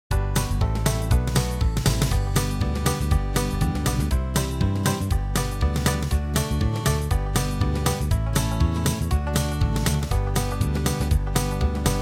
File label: red wing uptempo